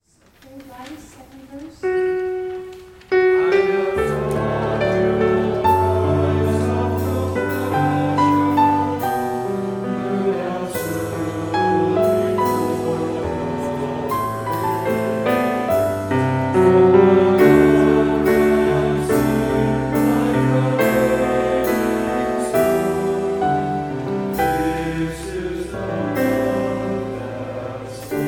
men

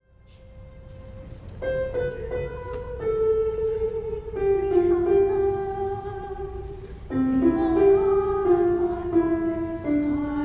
soprano; Gather Hymnal

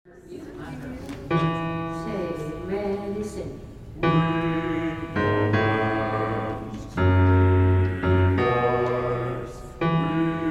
bass

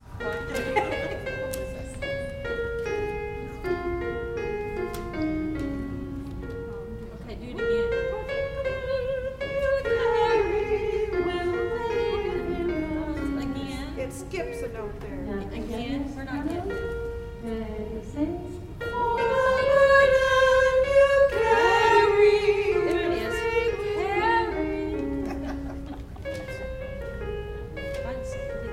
soprano; Gather Hymnal

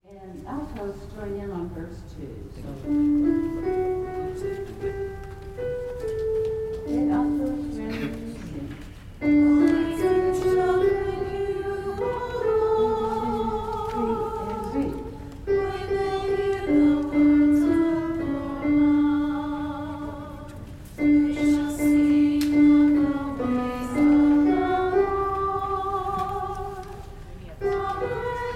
alto

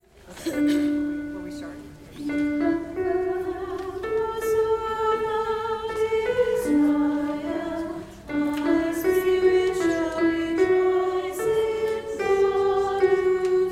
Magnificat - alto

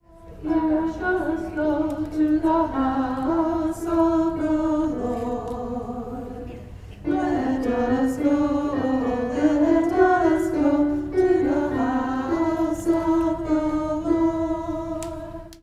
refrain alto; Gather Hymnal

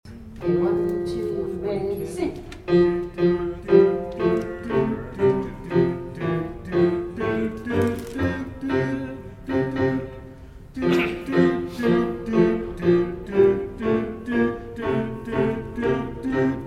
bass and tenor; Sing With All the Saints in Glory; Gather Hymnal

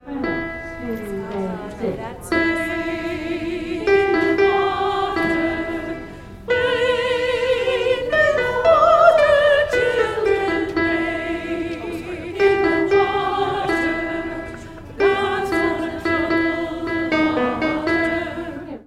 soprano

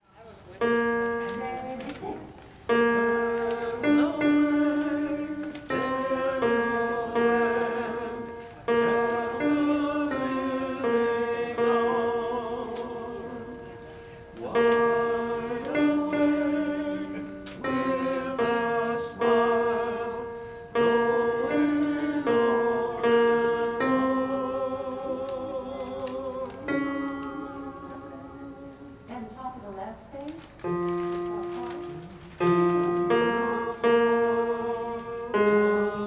Goin' Home - tenor

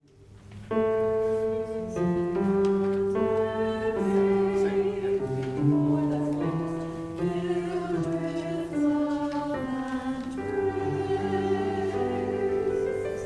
bass; Christmas Sanctus

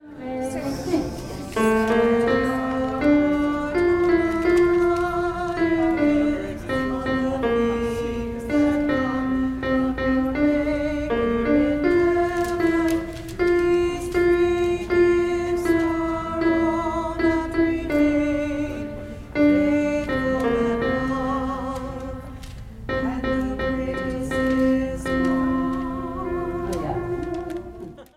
alto; Gather Hymnal

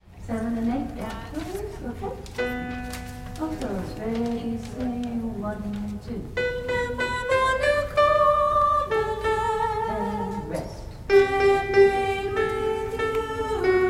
altos

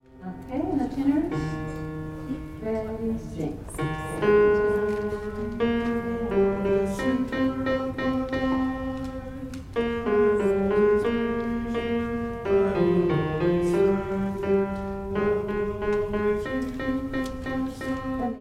tenor; Gather Hymnal

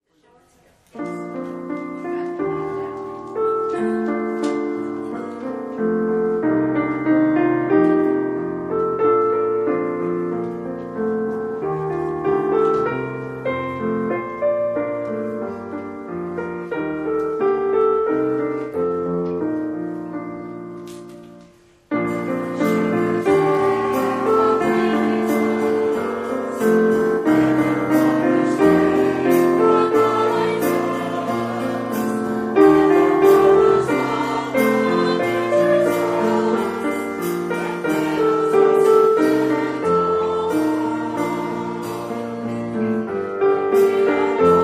unison; Gather Hymnal

